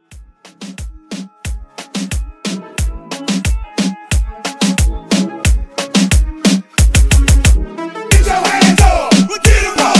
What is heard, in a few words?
Reggaeton Club Hype